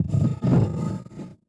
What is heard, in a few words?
Dragon Sniff